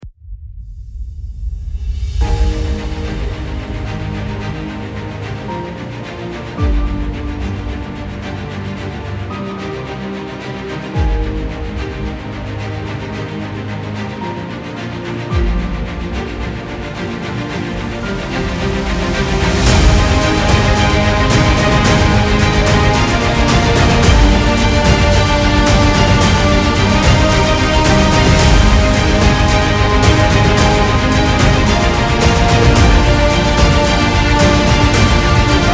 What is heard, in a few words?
Heroic mood